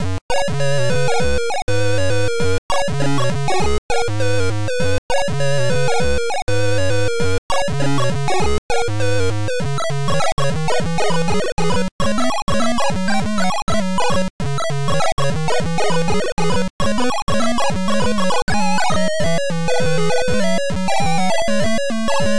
gameplay; OldTower for Vector 06C

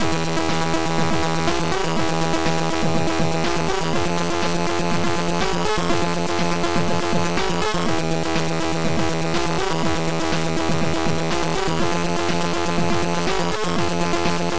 HARD TECHNO

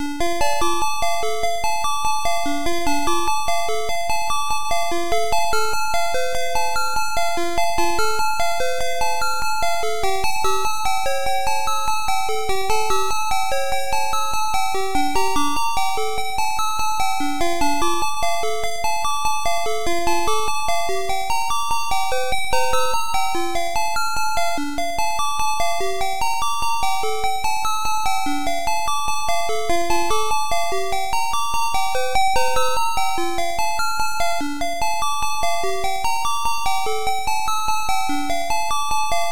Guitar song for intro

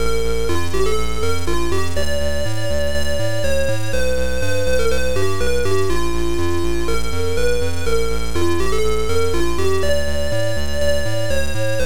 GUITAR; Flyer

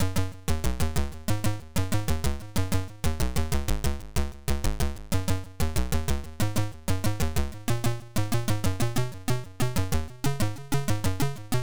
bass theme

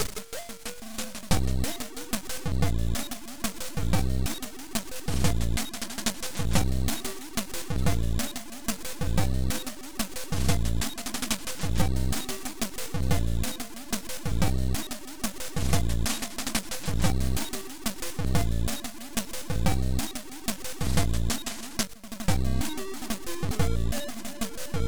Psy drum

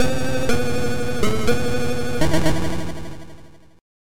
Death; MadMax; Xecutor

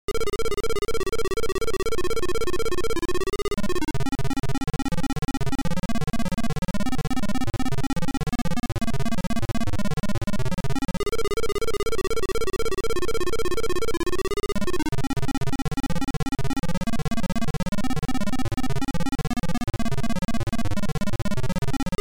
Intro Beeper; ZX; Soft Wizard

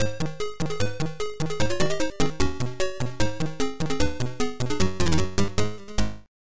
Game Over; Bomberman

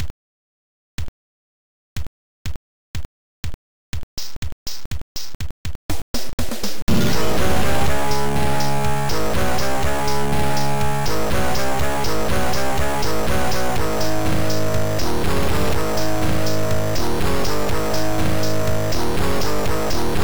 RockNrol

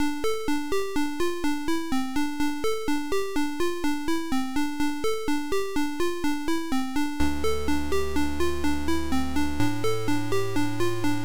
game music

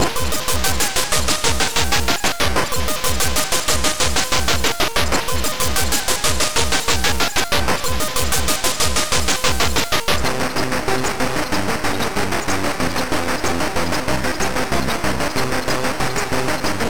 Noise

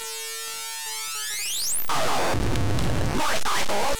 Intro, Sample, Beeper; I Ball

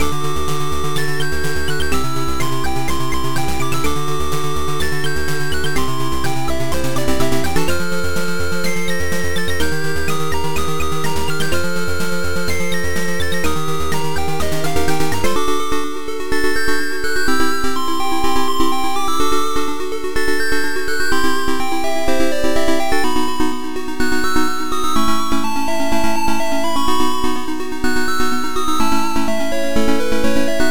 Game music; Wopgamma

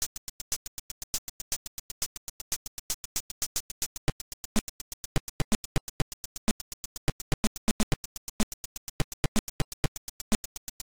Title AY Beeper; Chase HQ

